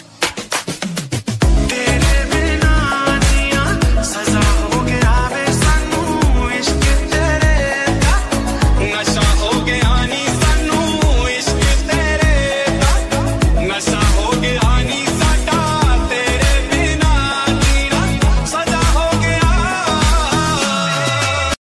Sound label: bollywood ringtone